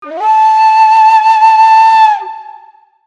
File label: whistle run